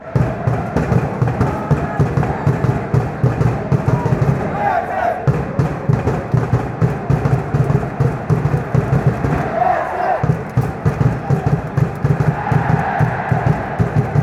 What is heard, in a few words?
ac, fanchants